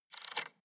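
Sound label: sports car interior volume button spin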